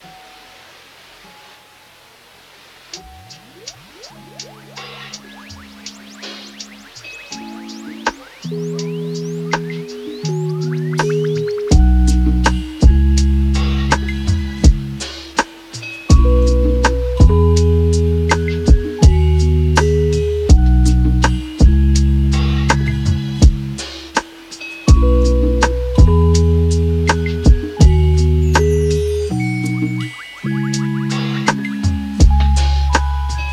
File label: lofi hip hop